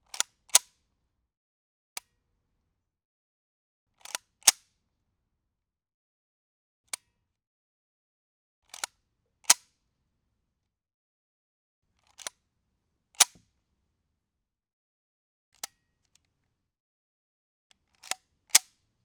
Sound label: Steyr TMP 9mm foley distant cocking & dry fire; MKH418S; Various Gun Foley & Handling